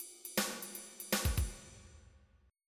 blues; Sounds Terrific II

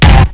effect short hard kick

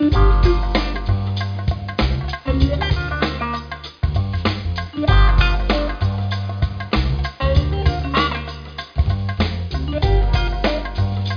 jazz

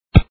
KICK; football extravaganza

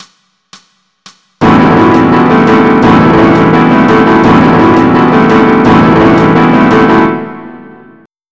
farruca; BUSKER L

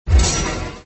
Menu Pop

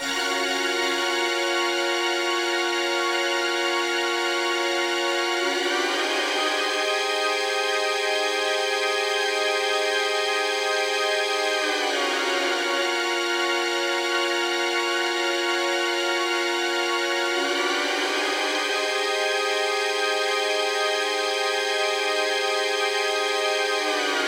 String Bender; Sonic Foundry; Fast Breaks

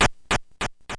Step; parsley; desert

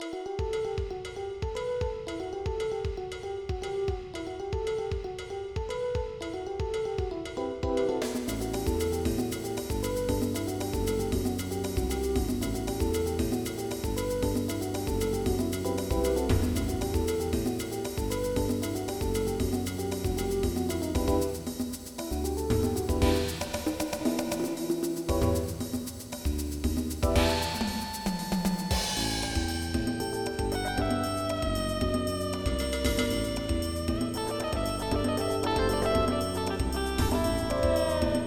jazz

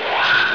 Flash Sonar; Covert Action; Strategy

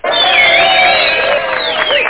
Crowd Cheers; Top30Games

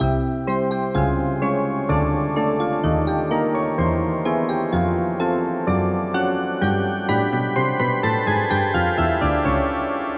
tune

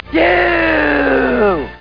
yooouuu

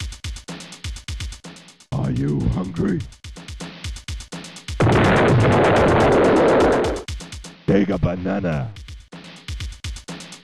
Sky Jingle

